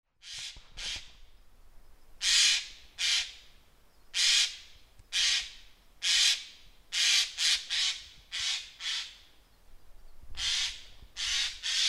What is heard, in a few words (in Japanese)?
kakesu, wakayama, koe